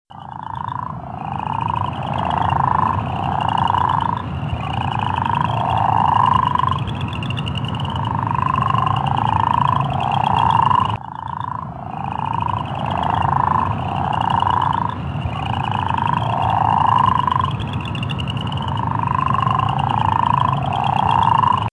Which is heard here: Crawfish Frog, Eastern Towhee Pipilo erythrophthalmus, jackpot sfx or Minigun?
Crawfish Frog